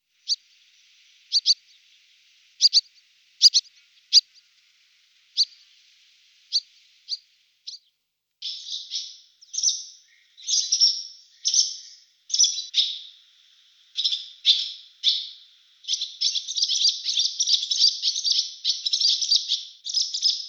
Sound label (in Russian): derevenskaya lastochka hirundo rustica